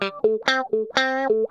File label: GUITAR LICK; Ueberschall; Jam Box